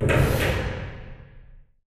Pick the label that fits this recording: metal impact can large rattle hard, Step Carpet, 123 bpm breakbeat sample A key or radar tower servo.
radar tower servo